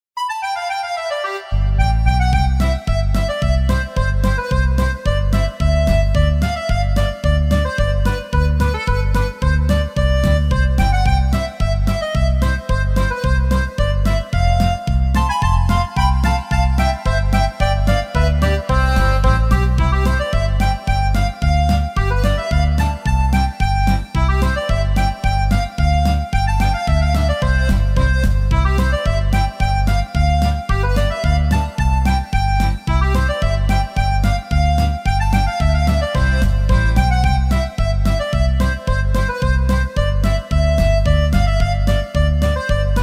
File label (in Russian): Vesennyaya polka